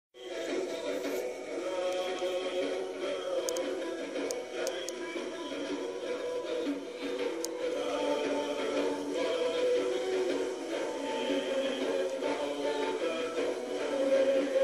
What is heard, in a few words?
Tanbur; khalil awlinezhad